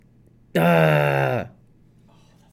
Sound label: dahhhhhh